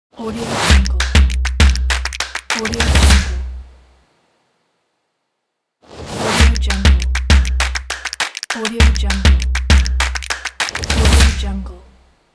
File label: claps and stomp percussion